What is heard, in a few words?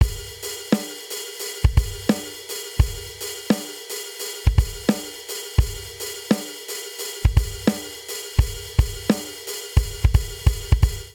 Inbetween Hip Hop 07 Outro 86 bpm, drum; Drum Booth